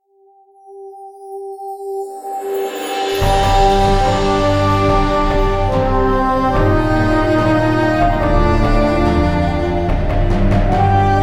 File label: main menu sound; Arc of Templar